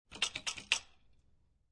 Toilet Handle Jiggle Shake, Household, Toilet Handle, Jiggle, Shake; Bathroom